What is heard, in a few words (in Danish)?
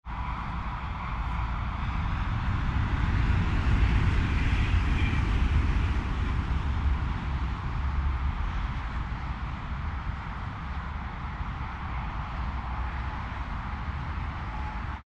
Tog og motorvejsstøj optaget ved siden af stillebænk